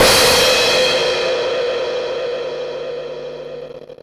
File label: crash R; Zero-G; Chemical Beats; CRASH ZOO